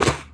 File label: footstep, skater; Tony Hawk's Project 8; XBOX